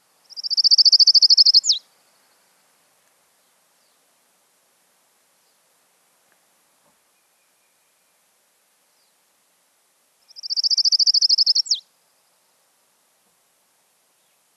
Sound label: Colima Warbler